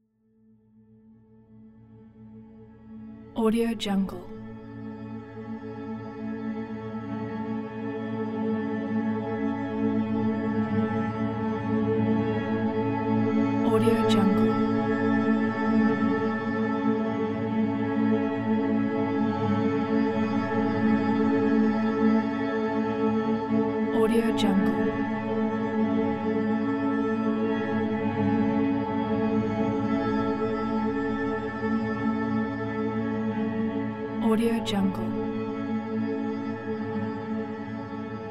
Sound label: positive cinematic undertone